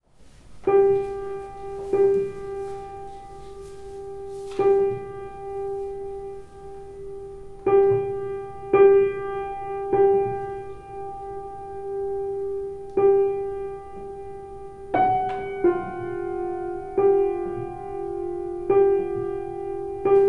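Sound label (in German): Klavierstück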